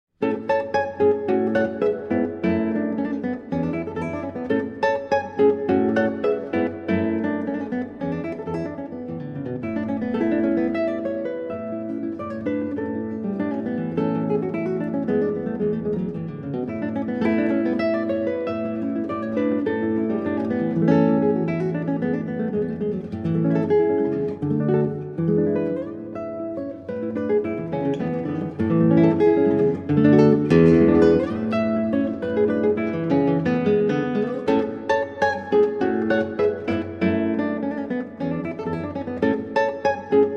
Valses Poeticos, Preludio. Vivace Molto; 8 Valses Poeticos; Arr. Raphaël Feuillâtre

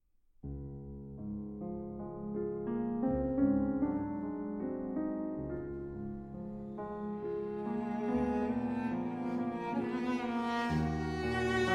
Romances, Andante molto; 3 Romances, Op. 22; C. Schumann; No. 1; Arr. Knoth For Cello

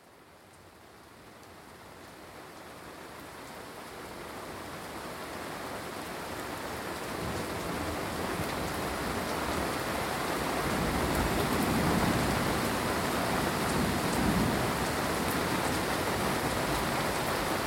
Soundscape Storm Song; Learning Resource For Children

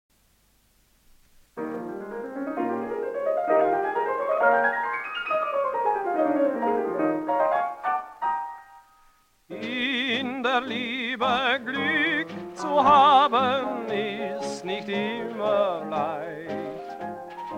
Orchestra

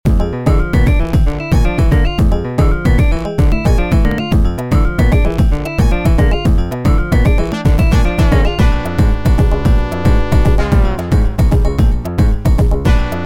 percussion; Streets of Rage 3

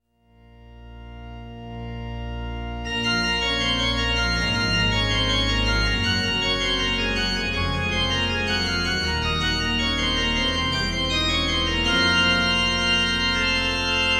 Reggae

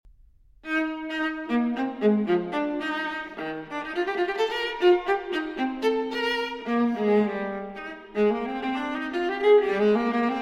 Cello Suite E-Flat Major For Viola Courant; 3. Courante; J.S. Bach; BWV 1010

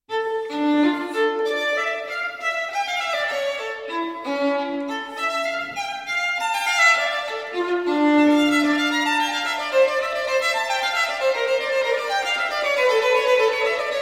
Partita in D Minor for Solo Violin; Partita No. 2; BWV 1004

